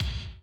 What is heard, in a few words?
Classic Drum Loops